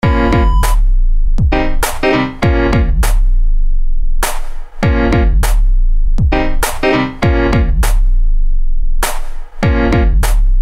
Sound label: beep; urbanbattle